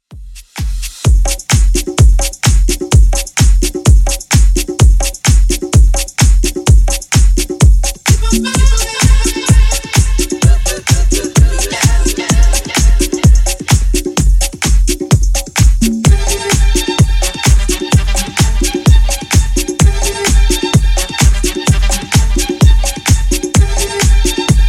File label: 70s Redrum 128 bpm